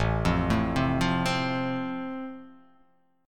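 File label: A Minor Sharp 5th, chord strum; Am#5 arpeggiated; 5,8,7,5,5,7,x,x; JGuitar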